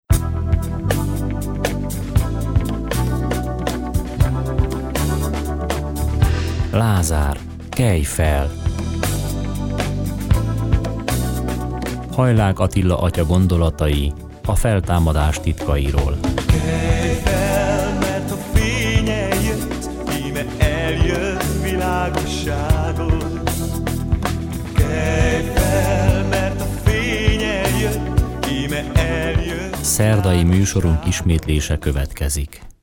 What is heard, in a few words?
jingel; Lazar, kelj fel